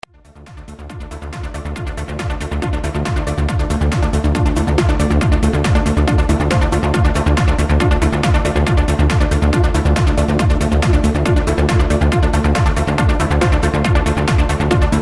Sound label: Trance